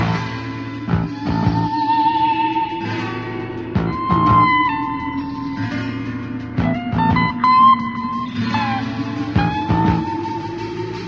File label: guitar, Jazzmatazz loops